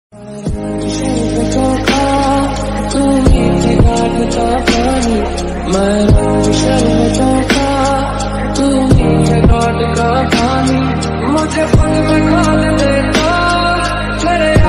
hindi ringtone love ringtone romantic ringtone bgm